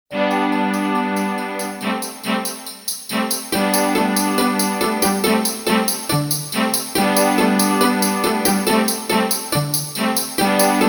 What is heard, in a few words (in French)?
VERSION KARAOKE; Alex et Zoé 1